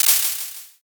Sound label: clap